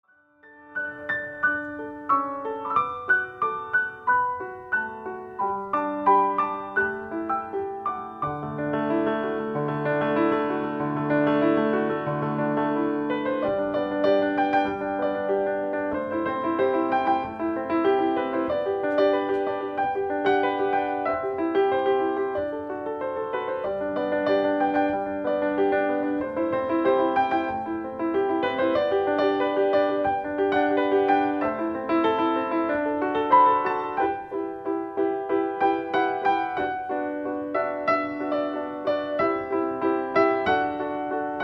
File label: Medley Of Blessing Piano